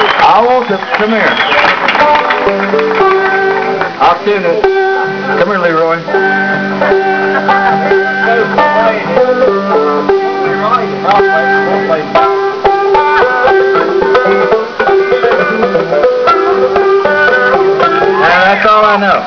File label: banjo